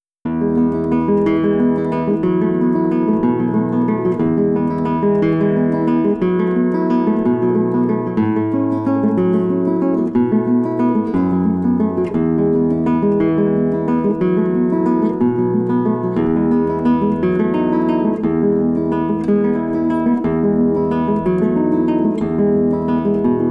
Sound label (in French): Prélude en Mi majeur